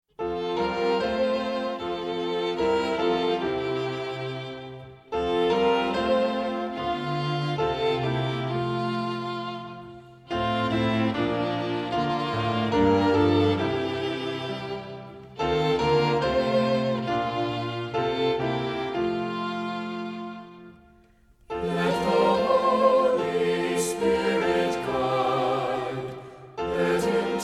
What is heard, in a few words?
words and music